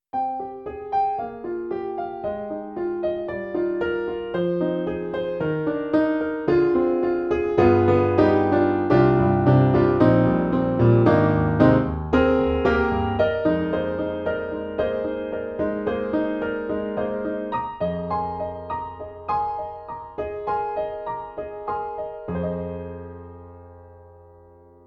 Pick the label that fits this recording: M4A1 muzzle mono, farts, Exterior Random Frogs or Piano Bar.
Piano Bar